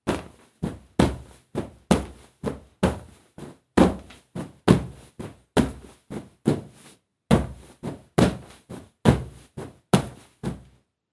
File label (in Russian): zvuk podushki